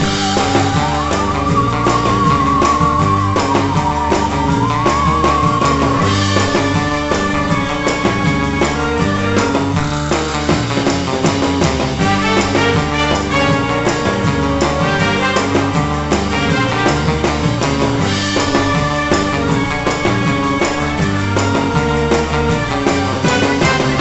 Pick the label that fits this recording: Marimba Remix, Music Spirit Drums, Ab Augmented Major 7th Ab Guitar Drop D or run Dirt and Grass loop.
Music Spirit Drums